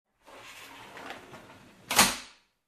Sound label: Steel Door close